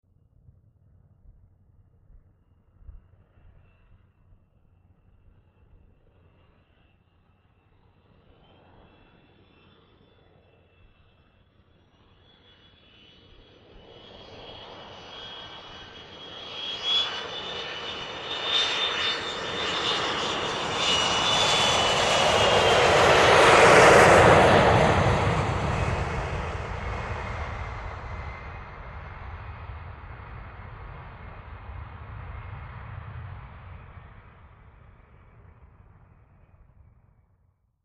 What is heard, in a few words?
Jet Fly Over Thresho, jets